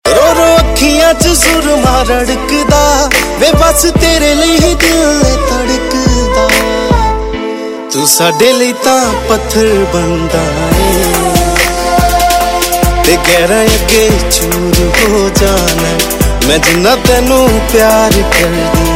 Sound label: Ringtone